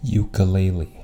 ukulele

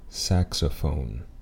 saxophone

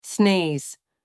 sneeze; anglais; health well being vocabulary

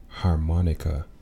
harmonica

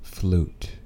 flute

